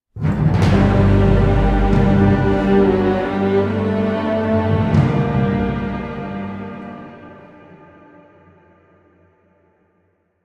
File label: String; Assassin's Creed Unity Dead Kings